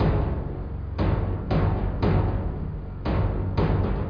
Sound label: timp